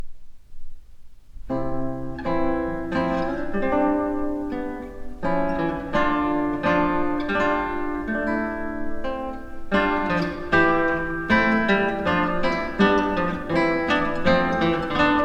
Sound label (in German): Studie Nr. 1 C-Dur, guitar